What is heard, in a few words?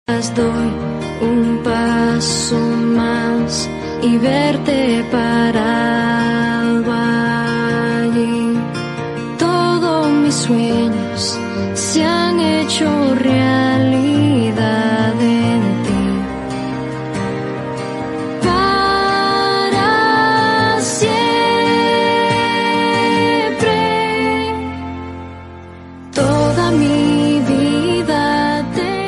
Te amare - spanish